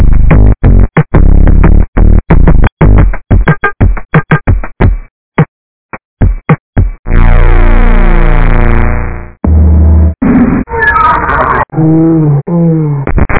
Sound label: drum SOUND; Multimedia FX